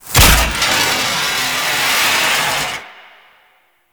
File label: Operator Lift; Sacrifice Arsenal; Warframe; Cinematics